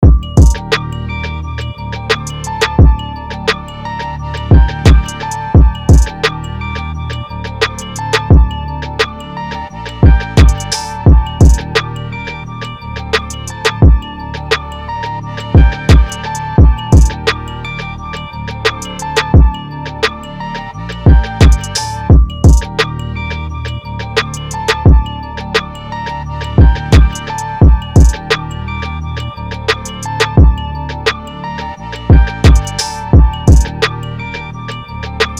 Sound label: 174 BPM Gm; UNISON DEMO; Nine Lives